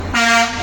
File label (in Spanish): bocina minitrenn